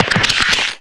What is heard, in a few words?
bonebreak